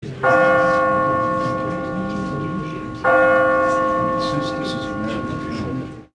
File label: church, buildings